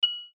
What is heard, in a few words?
Beep Tone Signal Multimedia; Web Interactive